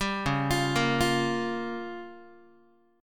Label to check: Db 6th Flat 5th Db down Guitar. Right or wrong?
Right